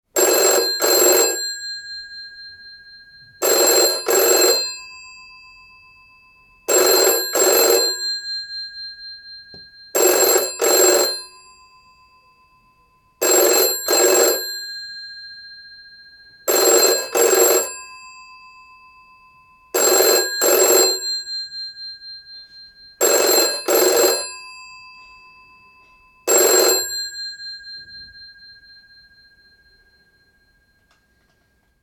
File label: Telephone Ringing Bakelite Phone 706 Model; Twin bell; 1940 to 1960s